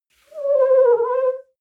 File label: clean window paper towel slow howl